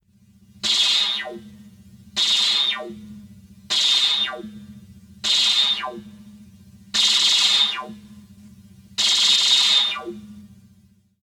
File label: laser atillery sound fx; alan howarth